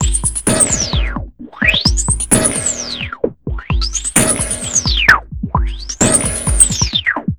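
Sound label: Messup Loop, beats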